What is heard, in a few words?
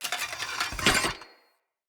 Two Handed Mace Equip C; Weapons; Enemy; Blunt; Sinecure Two Handed Mace